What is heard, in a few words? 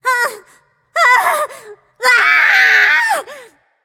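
nonverbal fear; smite; voicepack; izanami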